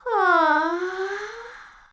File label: Yawn; Midna